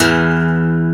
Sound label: 12STR, AC.GTRS